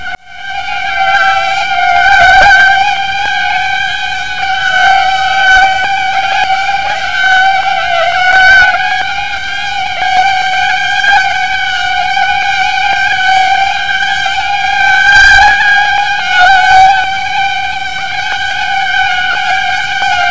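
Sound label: mosquito buzzing; kunchong jiaosheng; wenzi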